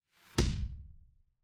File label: transition impact hit